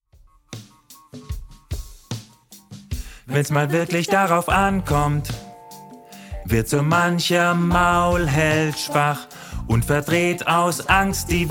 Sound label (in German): Lern Bass und Solo